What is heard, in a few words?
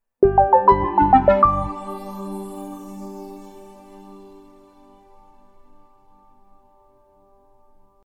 Sound Logo